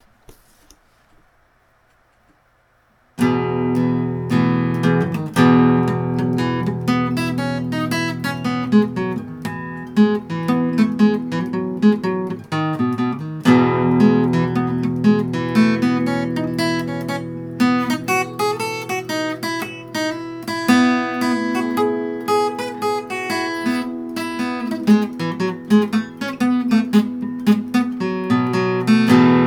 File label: hornpipe